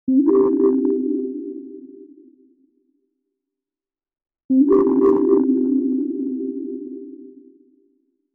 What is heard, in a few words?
short quiet sound effect; wild west